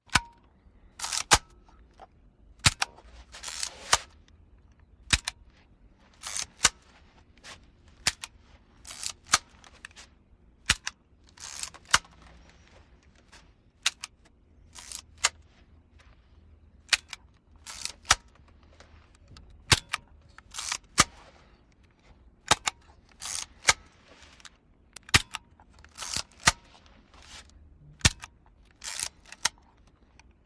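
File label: Instamatic Camera Click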